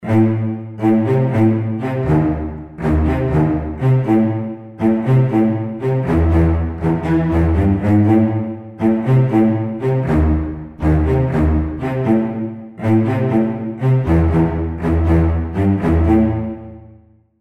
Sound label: action riff